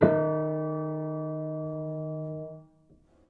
Piano Harmonics, Harm Low Mid

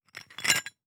Hole Saw Rattle, Hole Saw Bits

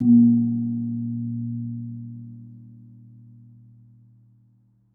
Kempul, Gamelan

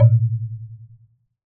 Wooden Bar Strike

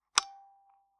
Taxi Meter

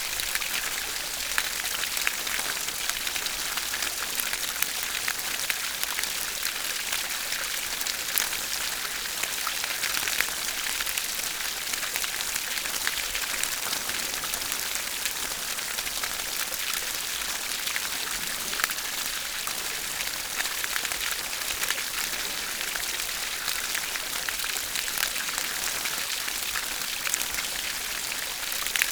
River, Static Microphone

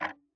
Tick, Clocks Kitchen Clock

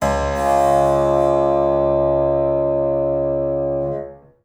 String Pluck Buzz, piano; Piano Miscellaneous

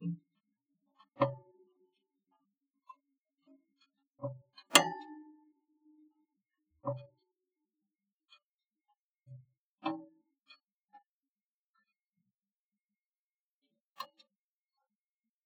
Cool Down, Toaster; Kitchen

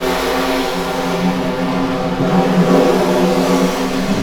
Snare Drum; Percussion Samples

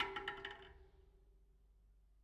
Spoke Bounce, Bicycle

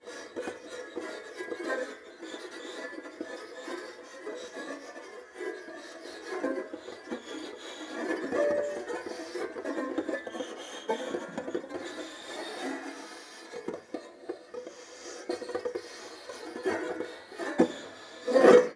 Rub Erratic, Piano; Piano Miscellaneous

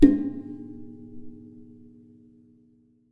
Classical Guitar Damped Pluck; Classical Guitar Extended Technique Stereo

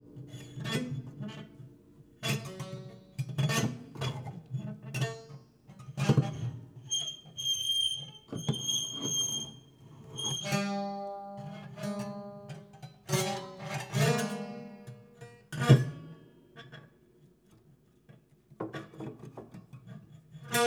Plier Pluck, Piano; Piano Destruction

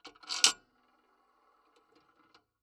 PayPhone

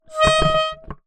Melodica Short Note

